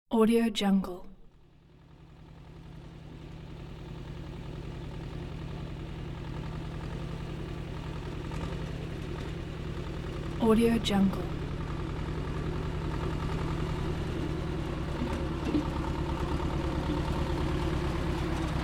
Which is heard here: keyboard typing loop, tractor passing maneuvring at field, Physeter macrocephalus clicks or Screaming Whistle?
tractor passing maneuvring at field